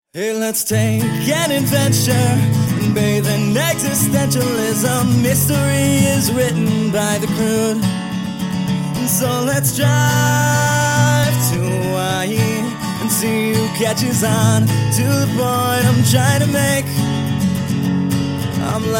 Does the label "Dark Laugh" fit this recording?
Yes